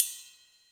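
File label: Ride B Lite, Yamaha DTXpress Cymbal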